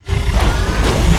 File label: Ready, weapon fx; lancer weapon fx